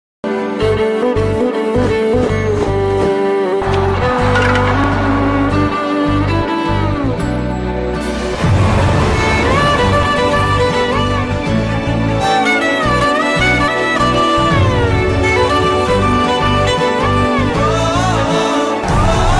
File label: movie emotional bgm ringtone; jersey